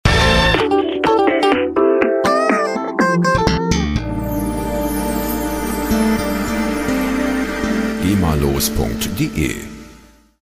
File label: funky tusch